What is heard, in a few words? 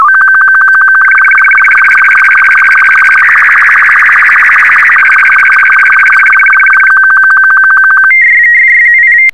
sstv; R8BW; VK4MSL SSTV RX @ QG62ln